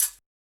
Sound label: HH, Yamaha DJX 2B